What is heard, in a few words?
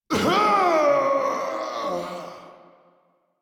Death; Warframe; Vem Tabook; en